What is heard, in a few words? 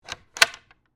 Cabinet Door Slide, Sliding, Lock, Open; Household; Cabinet Door Sound Effects; Blast Wave